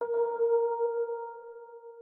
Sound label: organ